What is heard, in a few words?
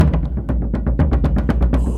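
Music Shield; from dust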